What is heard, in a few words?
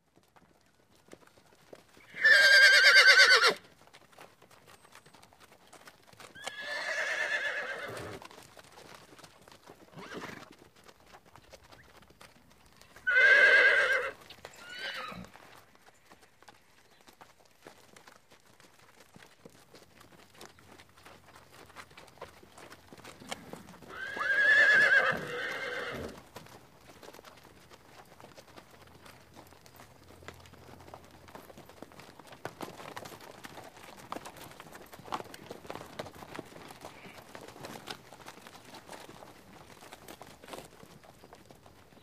Horses neigh restlessly, walk, snort